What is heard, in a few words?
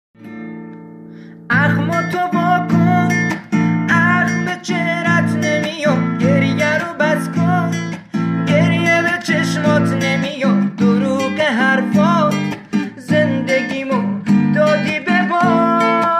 Guitar